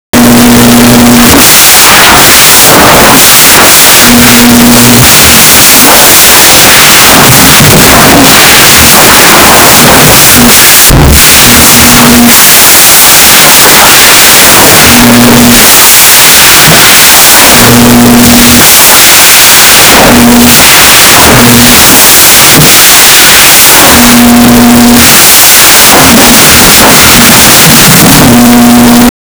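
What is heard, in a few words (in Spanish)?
sonido de sabanas moviendo, bed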